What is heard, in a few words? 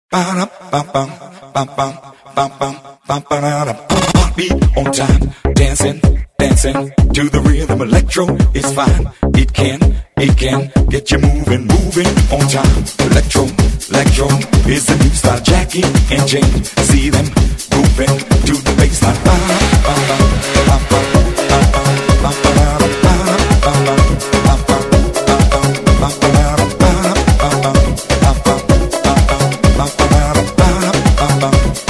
elektro